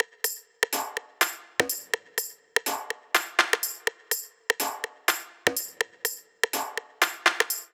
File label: 124 Percussion